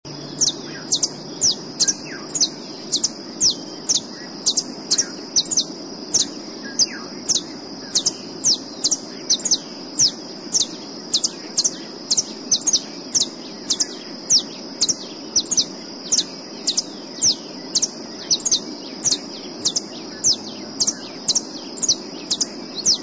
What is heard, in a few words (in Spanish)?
jilguero dorado, Sicalis flaveola, Saffron Finch; cantos